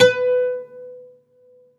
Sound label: UD H 4; SoundBytes; Early Patches